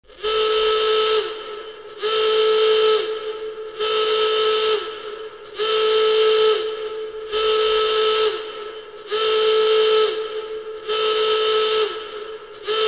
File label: Klaxon